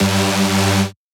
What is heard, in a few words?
Techno Synth Shot G; Synth One-Shots; Ghosthack; 45 Free Techno Synth Shots 2025